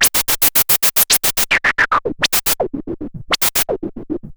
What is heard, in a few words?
MiniBrute 110BPM, Gated Seq Noise; noise hiss crackle